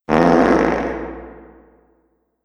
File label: reverb fart